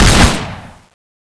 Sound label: gunkata skill